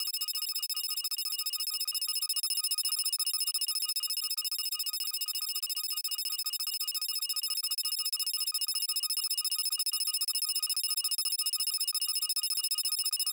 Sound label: Data Transfer